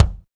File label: BONUS BD, Drums